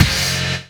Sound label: STAB